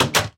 上锁箱子关闭声

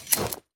卸下狼甲声

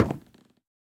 竹木踩踏声

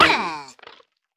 犰狳死亡声

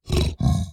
猪灵蛮兵待机声

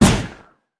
风弹爆发声

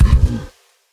骆驼冲刺声

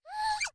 熊猫打喷嚏前兆声

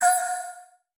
悦灵丢出物品声

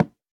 樱花木破坏声

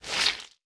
海绵 吸水声

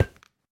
骨块踩踏声

